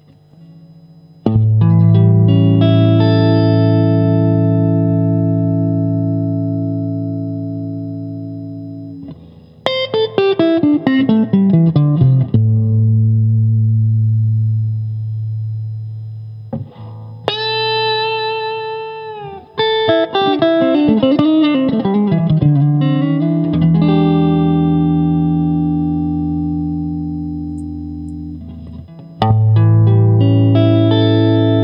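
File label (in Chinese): 60S OCC 单晶铜 清音 2档, acoustic guitar; kindman 60s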